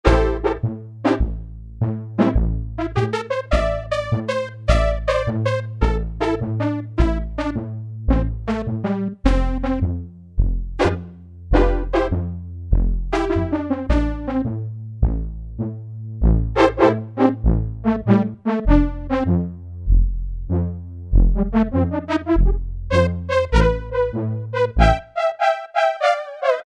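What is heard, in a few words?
OBX BRASS 2A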